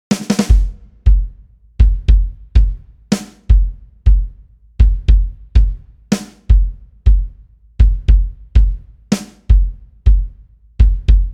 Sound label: drum track